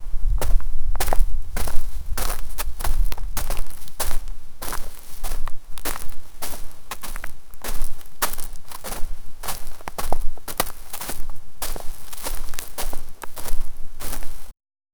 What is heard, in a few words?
footsteps on wooden on gr; walk